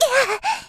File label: attack; Eternal Return; Tia; Voice Line; KOR